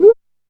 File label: DM5 Perc, Alesis DM5 drum machine